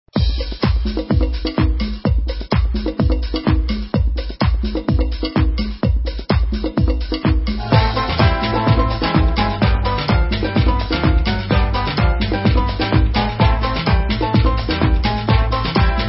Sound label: Instrumental